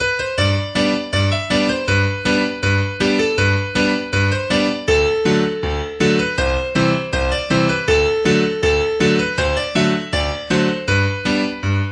Polka; Tip Top Polka

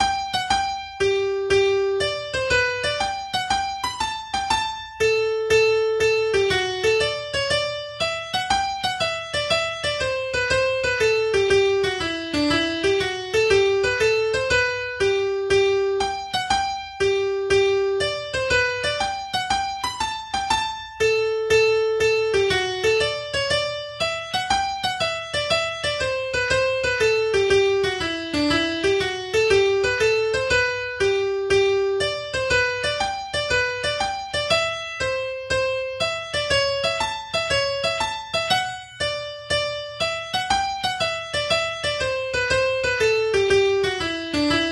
little slow Hornpipe; College Hornpipe

